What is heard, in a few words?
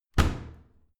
washing machine modern close hard